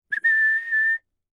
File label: whistle ta da